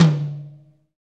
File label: TOM TOM, Real